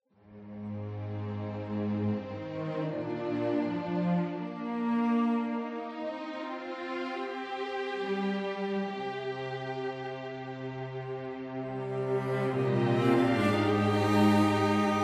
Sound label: Strings Layer